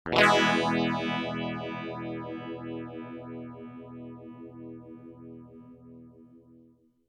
strum full depth regen at zero rate at noon 4 stages